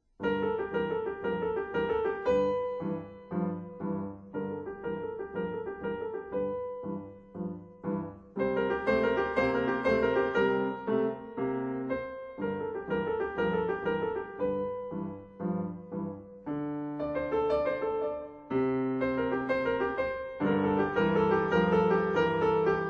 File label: Bittersweet Blues